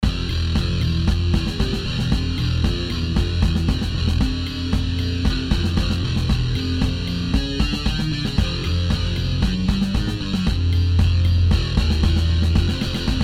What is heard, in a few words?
high pace with piano looped